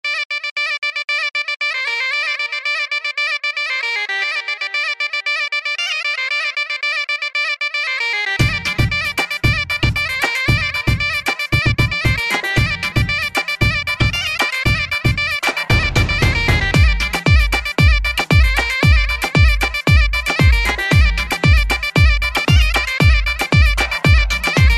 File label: Afghani Ring